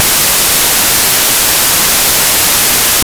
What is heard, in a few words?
Horn Concerto No. 4 in E Flat Major; Wolfgang Amadeus Mozart; KV 495 (3rd Movement)